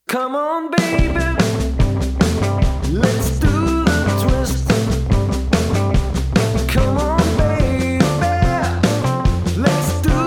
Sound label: Backing